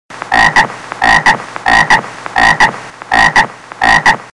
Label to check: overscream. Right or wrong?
Wrong